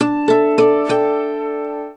Shutdown Sound, Mobile Sounds; Verizon

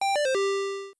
Windows 3.0 & Interface Managers Shutdown; Windows Never Released Soundpack 1

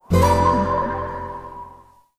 Playstation Portable (PSP) Startup; Video Game Consoles Startup

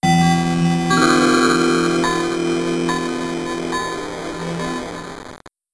PDA Startup; Windows Never Released Soundpack 1

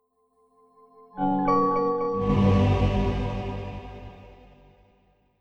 OS2 Warp 9.0 Shutdown; Windows Never Released Soundpack 2

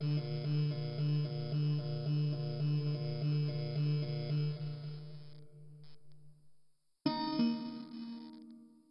Windows PDA Startup; Windows PDA Neptune Startup; Windows Never Released Soundpack 2

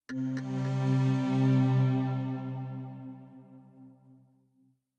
Mandriva Shutdown; Linux

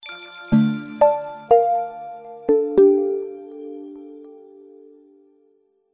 Samsung Galaxy Omnia Shutdown

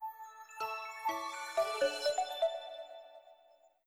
Samsung Galaxy A Shutdown; Samsung Galaxy A [SHW-M100S] (SKT Carrier) Shutdown

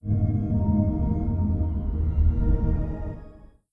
Windows Startup; Windows Never Released Soundpack 2

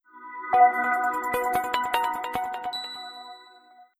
Samsung S4 Mini Startup & Galaxy A Startup

